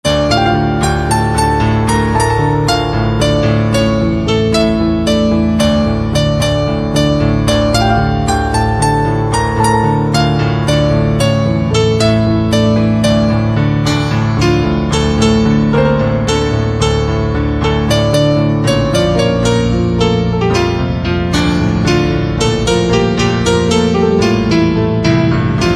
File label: Piano Instrumental Ringtone